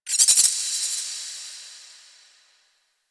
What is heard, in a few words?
Ocean Underwater Critter Small; Ambience; Warframe; Grineer Ocean